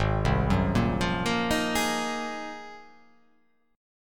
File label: Ab 13th Ab down, guitar, Ab13 chord; arpeggiated; JGuitar